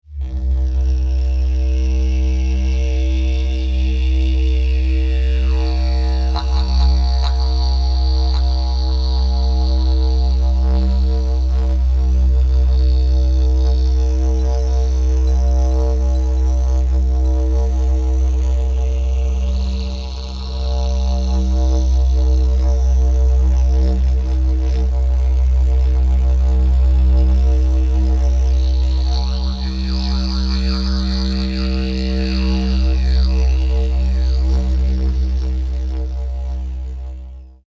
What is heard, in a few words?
DIDGE SAMPLE